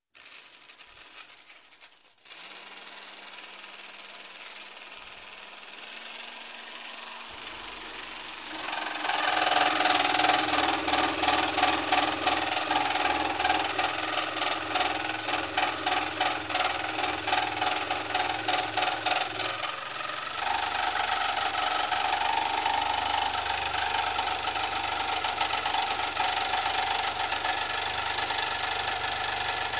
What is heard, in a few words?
Construction, Saw Jigsaw Start, Cut Wood, Stop; Sound Ideas 2000 Series